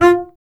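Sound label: string contrabass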